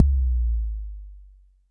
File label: Kicks, Elektron MachineDrum SPS1 MKII